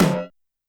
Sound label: Tom Industrial, YAMAHA RM1X